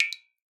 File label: Drips